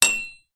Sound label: impact metal pole small